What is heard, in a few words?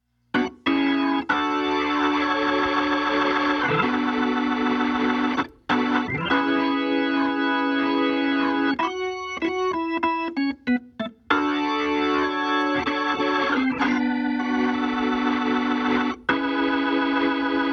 organ dry